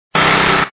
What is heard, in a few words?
drill